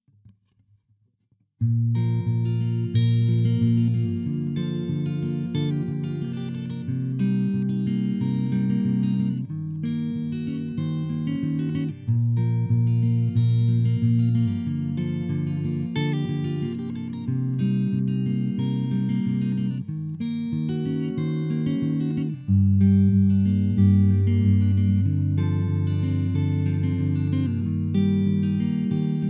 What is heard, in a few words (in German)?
Katana Akustiksimulation